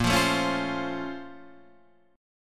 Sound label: A sharp Minor Major 9th; strummed; 6,8,7,6,6,8; JGuitar